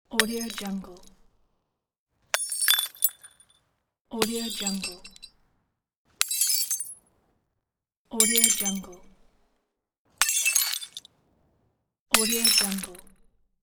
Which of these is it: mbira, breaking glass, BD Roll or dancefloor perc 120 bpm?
breaking glass